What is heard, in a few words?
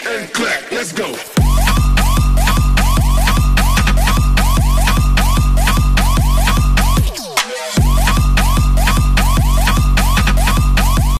iphone 4 remix ringtone